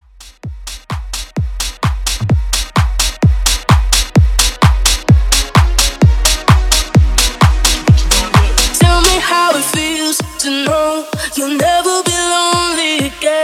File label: Dance Club Hits 129 BPM